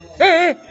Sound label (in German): hee, geisi stimme